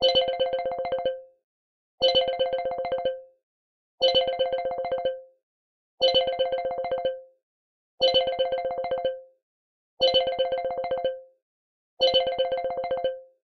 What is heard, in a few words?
Xylophone Bell, ringtones; LG Aristo 2 PLUS X212TAL